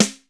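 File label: rap snare drum; East West; Global Sounds; HR16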